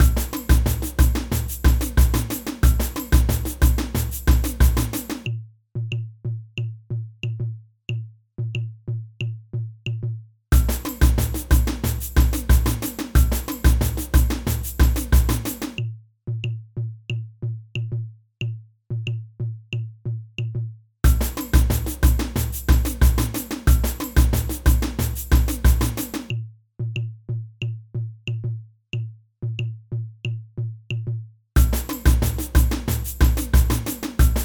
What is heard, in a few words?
surdo, samba